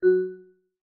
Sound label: Class Harp, notifications